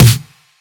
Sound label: subtle reverb snare drum sound c key, Royality free snare tuned to the C note